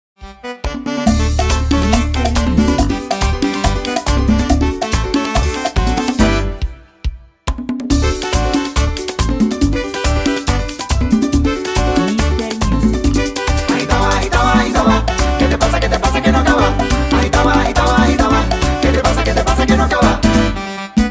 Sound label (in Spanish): Pista instrumental Backing track